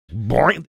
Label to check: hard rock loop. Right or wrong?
Wrong